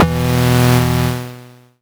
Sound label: Synth Shot E; Mutekki Media; Vengeance Electroshock Vol.2